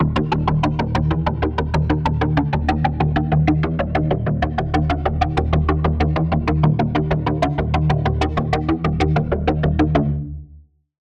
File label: Pluck; Pluck - The Sin; Routed Sound; Spire Expansion - Doom Carpenter Vol.1